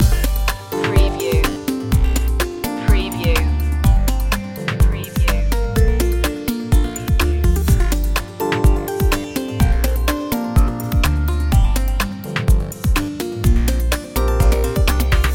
loop, chill

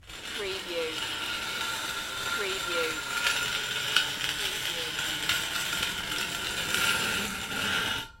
Metal Grind Scrape Large Rock Along Metal Bar

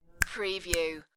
Two Snaps

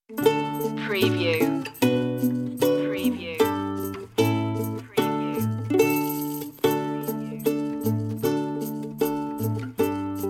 deck the halls with ukulele underscore

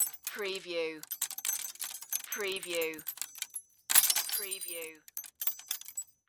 Debris Glass Drop

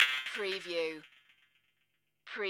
Reso Mouseover

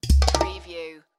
Musical

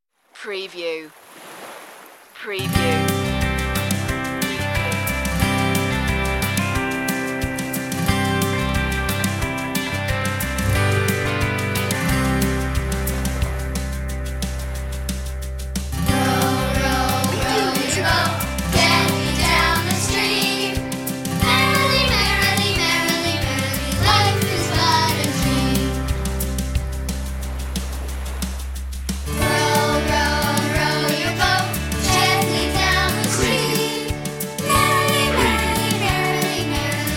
children group vocal

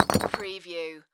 Concrete Debris

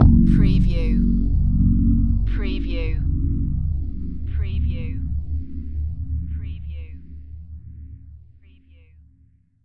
Hit Electric

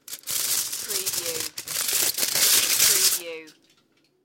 Metal foil, aluminium foil rip

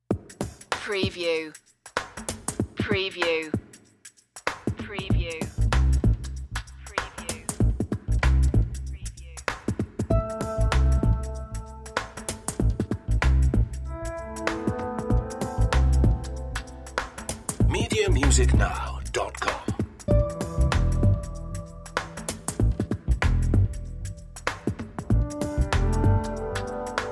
turmoil activate no strings

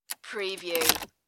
car key insert and pull

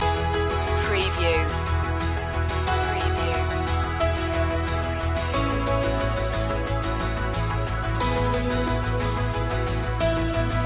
spread your wings loop

